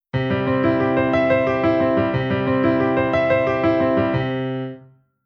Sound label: Keyboard AP Soundmake Mic